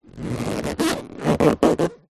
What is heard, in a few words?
balloon inflated handling squeaks; foley